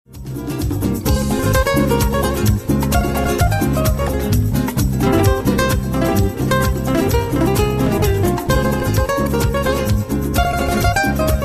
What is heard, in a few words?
solo guitar; ceremony